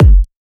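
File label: Kick, G minor; Sample Banks; Percussion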